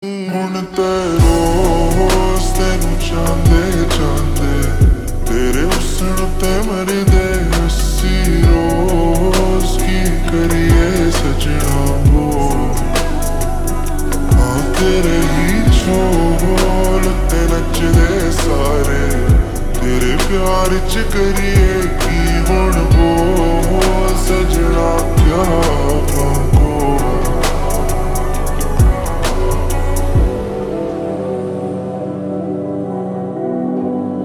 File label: Slow Reverb Version Ringtone